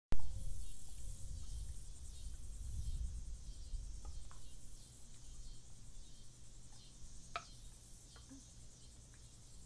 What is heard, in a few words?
popping example, salt marsh